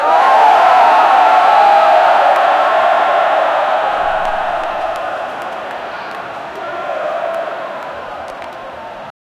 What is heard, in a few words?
disappointed crowd booing, stadium